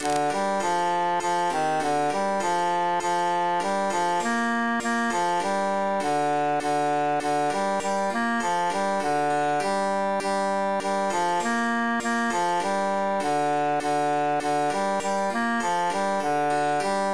bassus emphasized

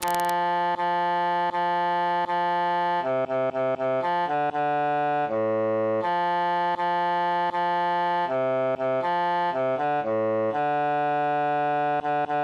bassus solo; pase el agoa

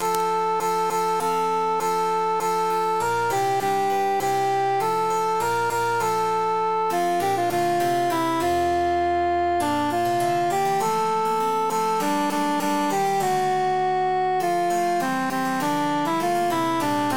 altus emphasized; fine knacks

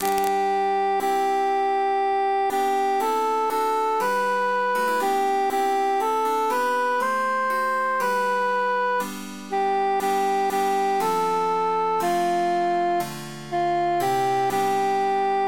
transposed cantus emphasized

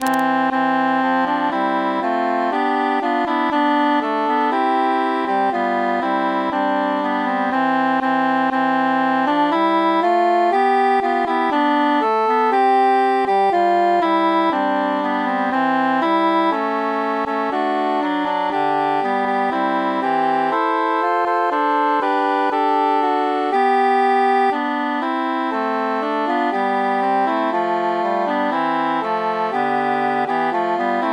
bassus mute; since first i saw

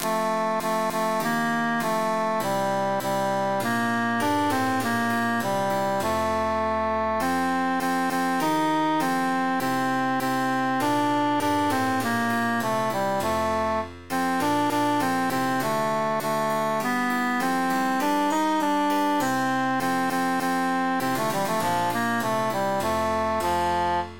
tenor emphasized; matona mia cara

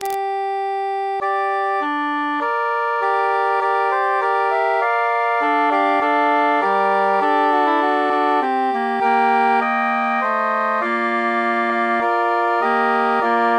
bassus mute

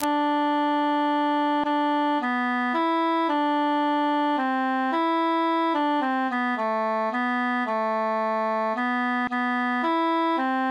tenor solo; jubilate deo

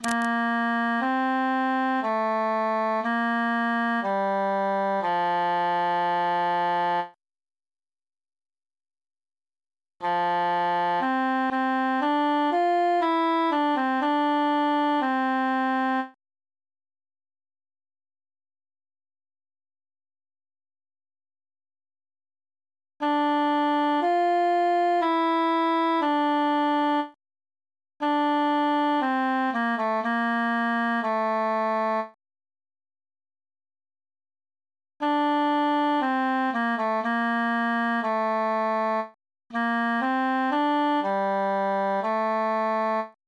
tenor solo

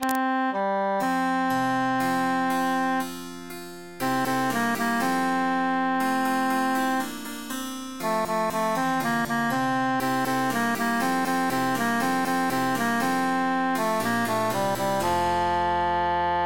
tenor emphasized; psallite unigenito

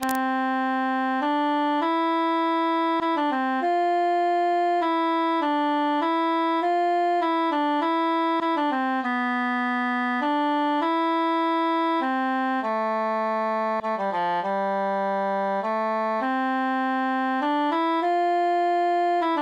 tenor solo; aucun se sont

